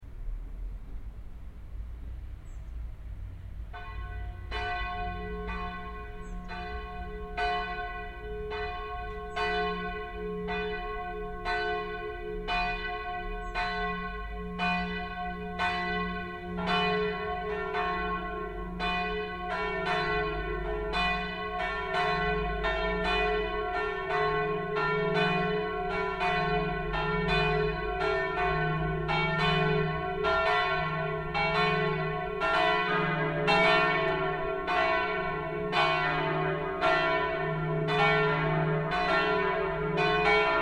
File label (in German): Plenum, Glocke; Wevelinghoven